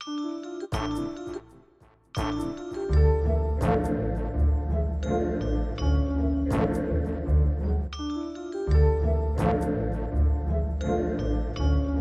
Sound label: Melody